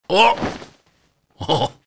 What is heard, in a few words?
red panda anim hunker; netent